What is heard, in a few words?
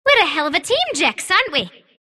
sylph attack emote; dota; dark willow